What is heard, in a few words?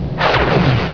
fire pulse